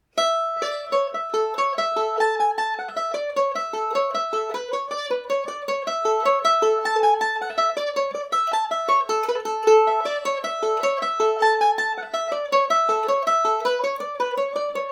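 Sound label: A major, irish mandolin; Hennigan’s Favourite; G&O #34 mandolin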